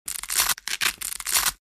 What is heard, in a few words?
Tearing velcro on boxing gloves